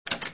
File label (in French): texture loquet